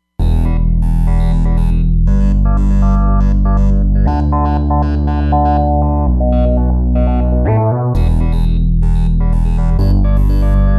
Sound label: S&H Bass, Roland Fantom XR